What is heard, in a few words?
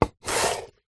apple slice knife wooden chopping board